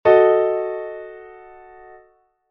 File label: D#°, chord inversions